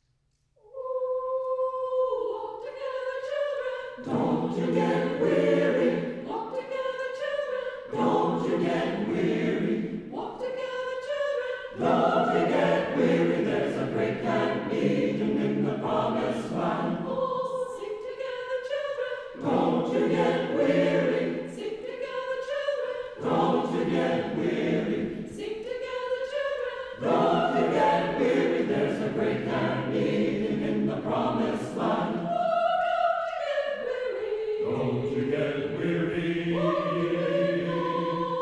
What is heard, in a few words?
Walk Together Children Traditional music